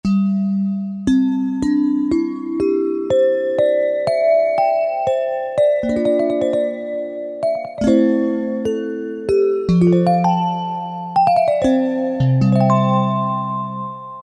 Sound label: Korg T3 Pot Covers